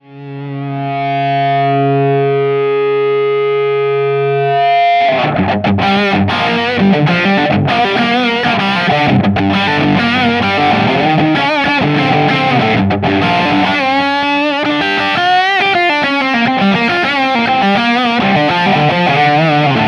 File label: Lead Strat; Gain 12; Treble 12; PPIMV 17; VVR 17